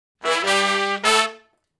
Shuffle Blues Riff (A) low unison, Horncraft for R&B Sections